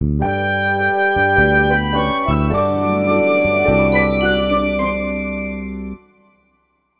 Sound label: Bari Sax; Simpsons Hit & Run; PS2